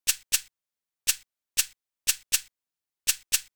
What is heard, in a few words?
626 Shaker; hydrogen